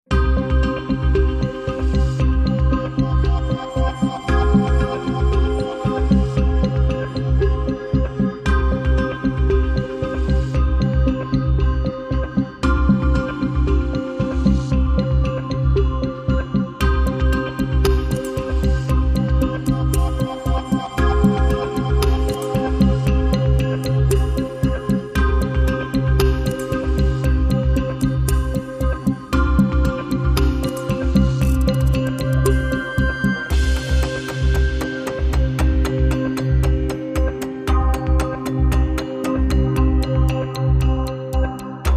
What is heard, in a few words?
No Kick Drum